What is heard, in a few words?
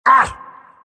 abys pain; abyssal underlord